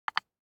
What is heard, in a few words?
DICE on Wooden Tray, Drop, Standard, 1 One Die; Samples; ArticulatedSounds; DICE Sfx Party (Extended)